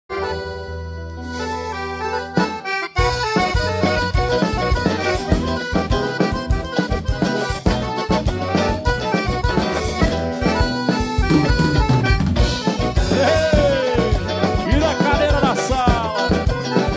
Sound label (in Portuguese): vanera de galpão, live, milonga